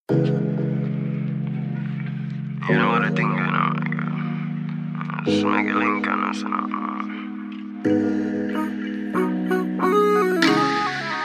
trap life riddim

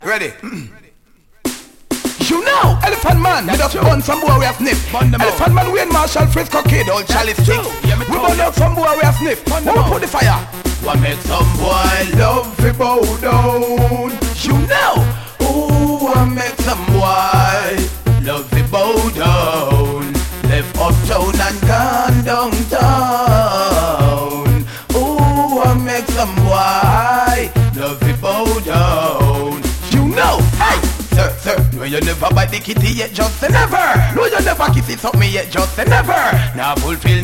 riddim

